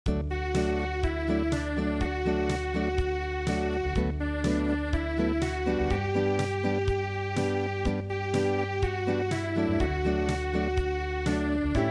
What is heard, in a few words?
Unplugged; midi; traditional